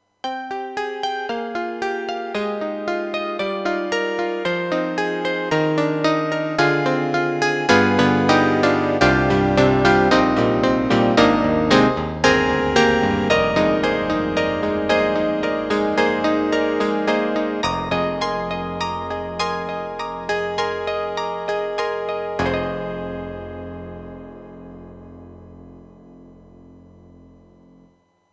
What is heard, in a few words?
Yamaha MU500 Synth CP